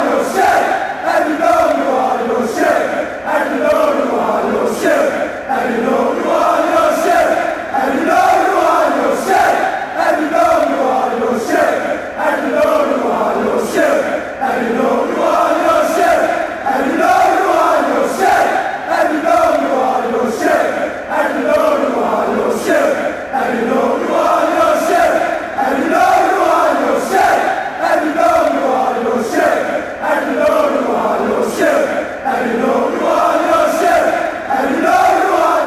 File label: youre sit and you know you are, chants